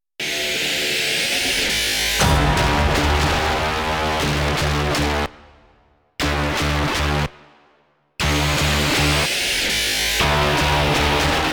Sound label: black box no choir